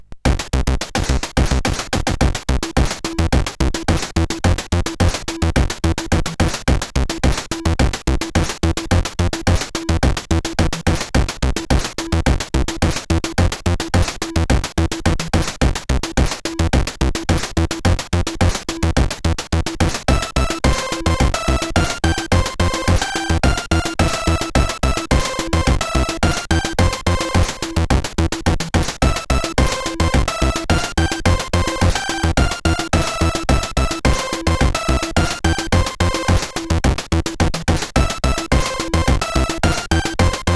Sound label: sid, 8580R5